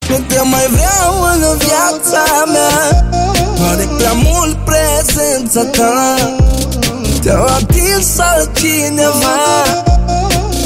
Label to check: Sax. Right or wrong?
Right